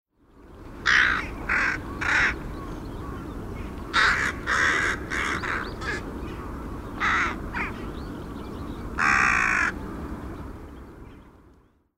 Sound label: Rook